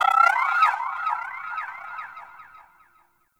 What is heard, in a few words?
Armadillo Vocal Captured Lift Away; Warframe; Characters; Hunting Critters